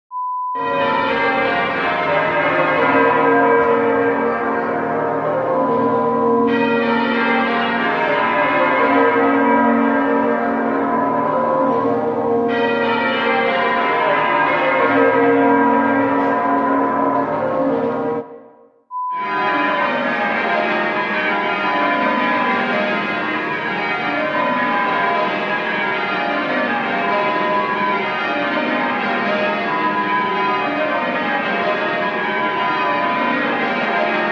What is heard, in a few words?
Wedding Bell Collection sounds